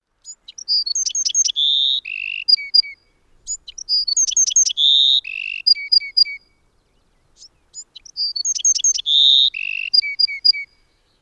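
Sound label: Bewick's Wren song; UT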